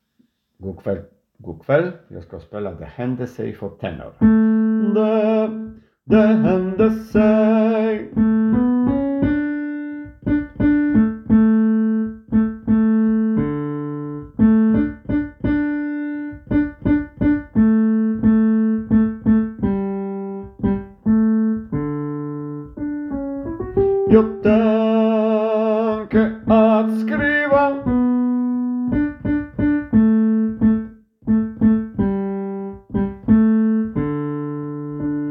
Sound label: tenor